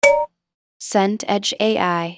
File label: kalimba note